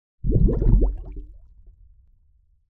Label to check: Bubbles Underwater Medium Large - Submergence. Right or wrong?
Right